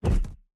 enemy duck fly flap

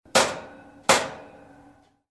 oven pan hits; panela

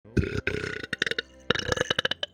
big burp

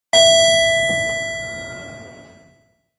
elevator ding

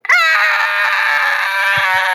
aaaaaaaaah

